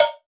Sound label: V-Drum Cowbell, Percussion; Roland BK-5